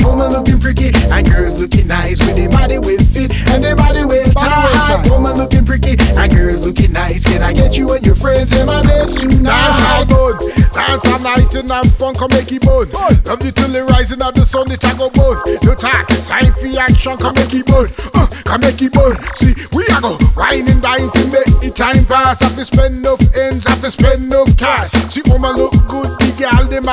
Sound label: Dancehall Burn Ringtone